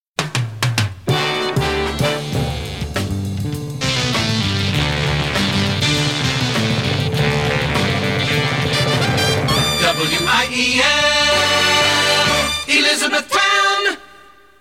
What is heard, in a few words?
jingle